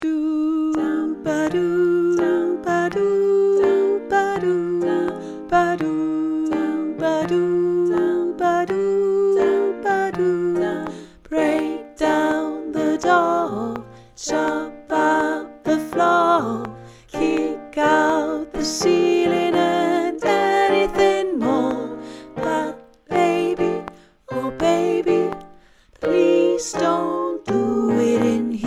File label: Bass